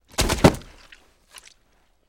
Body Falls Mud Heavy

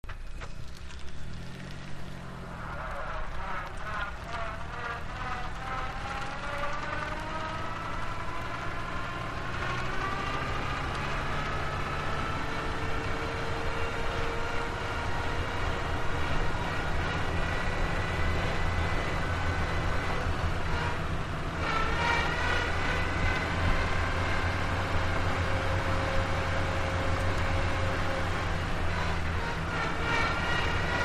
Car Reverse Whine; car various

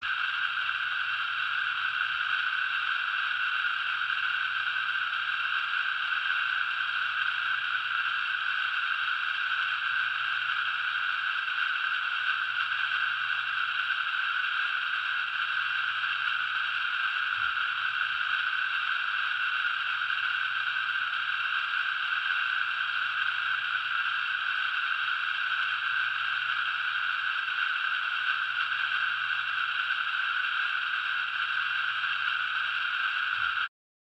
Ambience Exotic, drones & tones

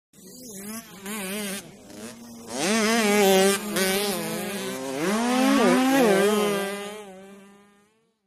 Motorcycle 80 Bys, motocross

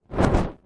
Torch Movement; torches

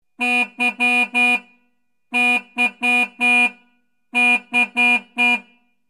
Dodge Fire Truck Horn

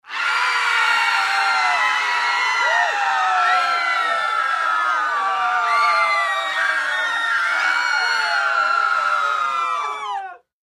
Crowd Panic Screams

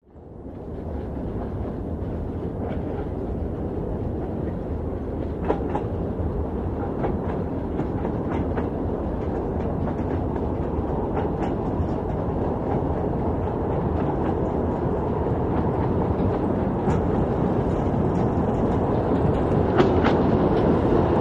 By Diesel Train Up Pas

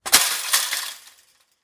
Chain Link Fence Gate Drop on Another Fence

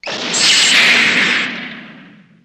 Arc Crack Multiple; arcs & sparks

